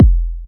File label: phat kick drum sound f key; drum single hits one shots; kick drum samples